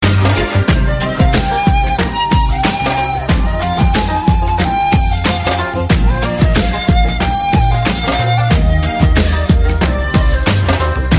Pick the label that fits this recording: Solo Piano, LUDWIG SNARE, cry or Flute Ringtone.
Flute Ringtone